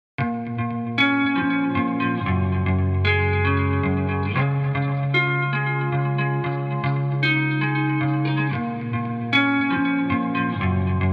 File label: guitar before